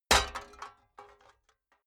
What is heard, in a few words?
metal clad plate hit; industrie-wirtschaft